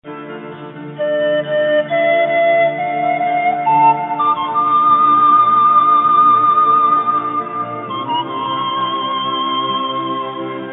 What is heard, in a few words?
Flute Piccolo